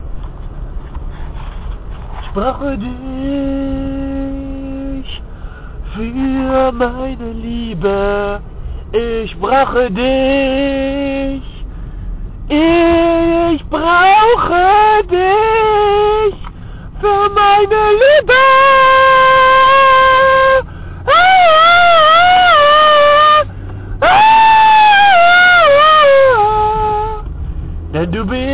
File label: Acapella